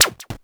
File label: polyvox groove, Polivoks; Formanta Radio Plant